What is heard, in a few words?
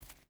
footstep barefoot concrete walk; Rust